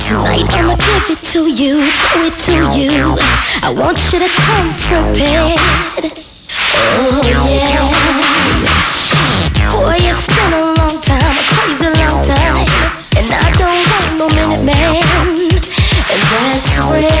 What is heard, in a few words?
[Instrumental]